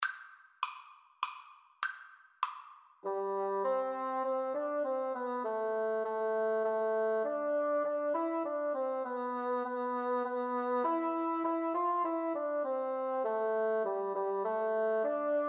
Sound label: we wish, Bassoon Duet